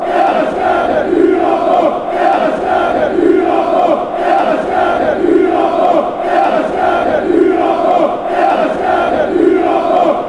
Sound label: chants; strength